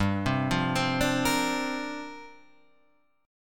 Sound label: C 7th Suspended 2nd Suspended 4th, G